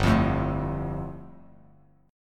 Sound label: G 7th Suspended 2nd, Bass Standard; strummed; JGuitar